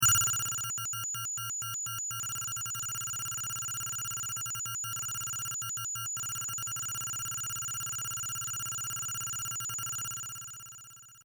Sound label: SciFi Scanner, Sci-Fi Scanner Sound Effect; Communication; Perfect for general Science Fiction usage or Multimedia usage; CraftedSignals